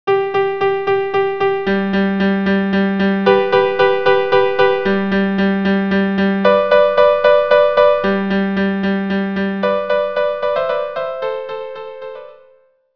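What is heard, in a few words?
Overture violin unaccompanied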